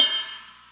Korg N5 Rock Kit C#3

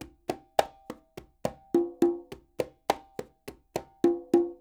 NYC Percussionworks loops; Sampleheads; SF LOOPS 104

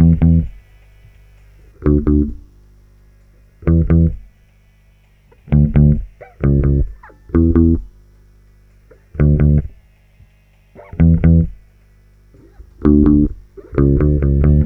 FEVER 131, Bass Grooves; USB Soundscan vol.30; AKAI

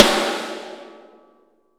NCSNCNRFAR, drums; Best Service; Real Mega Drums VOL-1

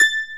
MANDOLIN

